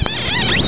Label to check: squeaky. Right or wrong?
Right